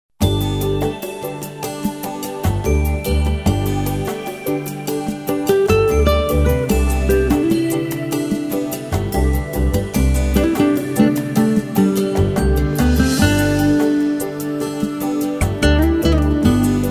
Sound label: holiday